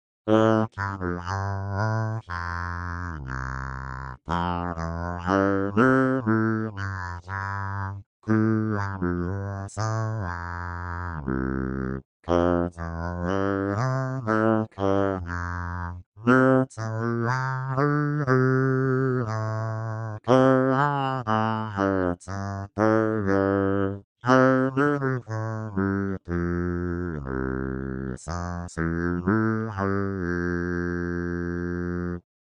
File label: Guitar